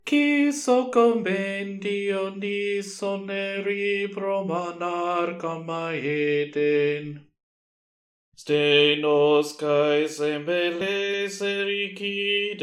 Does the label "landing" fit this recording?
No